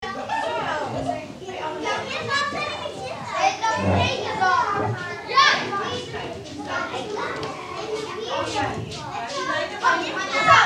Childrens interior playground lively walla playing loop